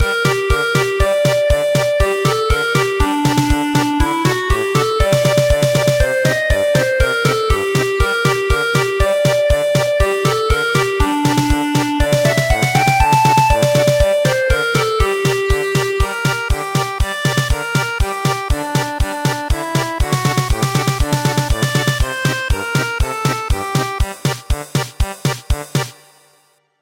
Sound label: German Polka; Canaries